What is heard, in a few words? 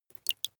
dripping single